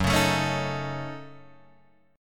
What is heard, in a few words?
Gb Minor 6th Gb Guitar Drop D